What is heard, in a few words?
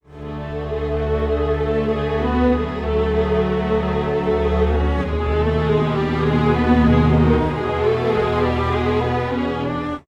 strings; timestretch